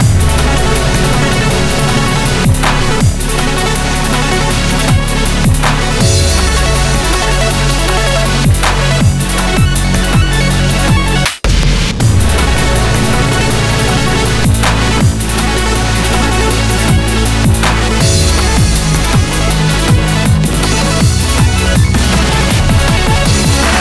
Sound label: Grind Oscillator